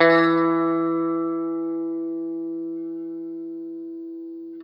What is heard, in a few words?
Guitar & Bass; Quantum Leap; 90's Sample CDs